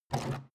canister empty pick up

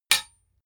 sword metal hit

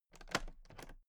toolbox latches open heavy

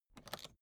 suitcase latch lock spring creak

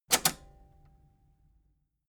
metal button robust spring click enable fast

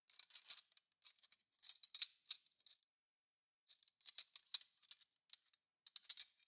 cutlery spoons handle soft

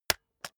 keyboard external space hit slow

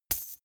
chain mail impact hard

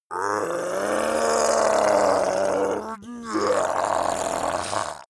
zombie human change

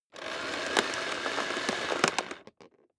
vacuum cable pull in

